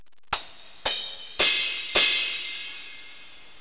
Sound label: cymbols